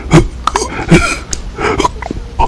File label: gasp